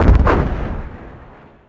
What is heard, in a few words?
shield; NanoFXv2; ExplosionFX; ExpShield